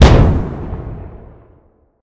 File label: gunshot